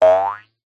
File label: jump